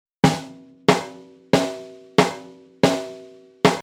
snare raw

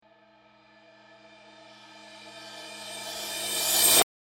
cymbal rev

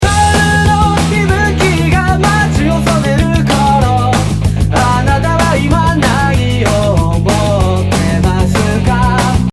delay compare waves delay

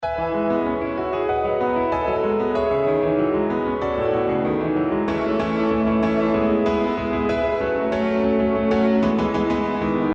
piano compare sampletank3 effect

